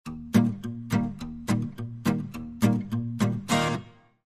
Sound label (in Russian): gitara